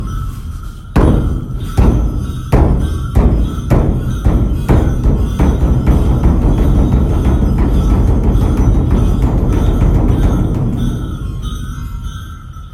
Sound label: gong temple shangrila; chine; monastere-ganden-sumtseling